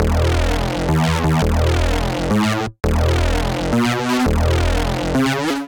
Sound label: Ab Minor 169 bpm, Bassline; MIDI Clips; Tonal; Skitter and Step; Ableton Factory Packs